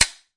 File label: dry fire